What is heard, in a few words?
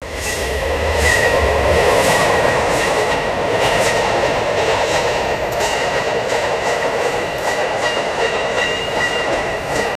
the sound of the railway